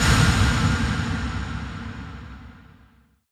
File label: Impacts